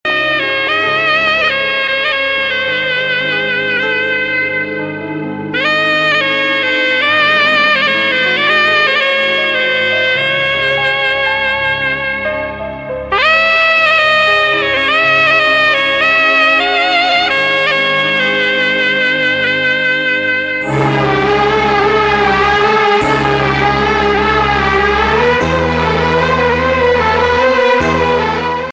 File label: very sad music ringtone